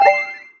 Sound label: ui Button Default; ChillConnect